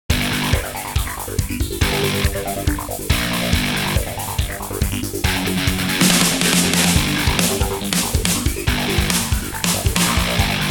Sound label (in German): Elektronik; Spacebourne